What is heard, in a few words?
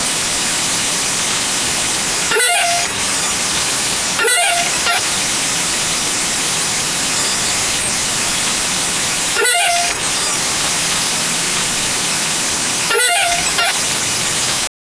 something went wrong fail, error